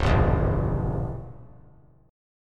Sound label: Db Diminished 7th Db down; strummed; JGuitar